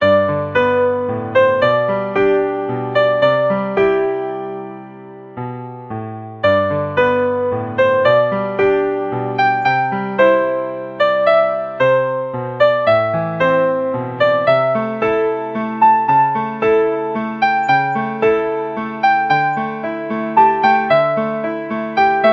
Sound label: Melody sketch